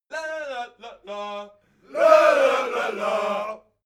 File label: army song segment; militaer